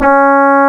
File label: C#, Synclav FM, BRASS; Big Fish Audio